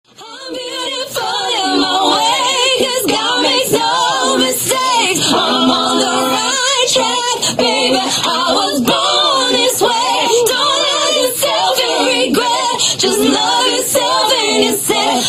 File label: Acapella